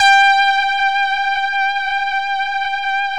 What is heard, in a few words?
VIOGUITAR; E-MU Formula 4000 Series Vol. 2